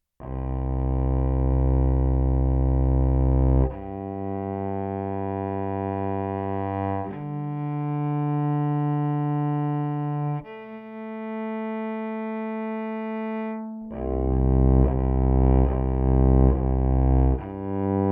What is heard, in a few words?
pickup direct